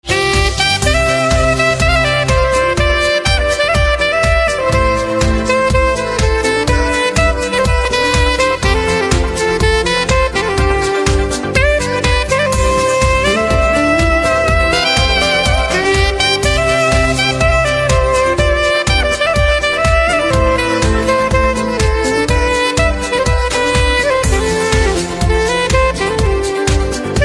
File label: Saxophone Instrumental Ringtone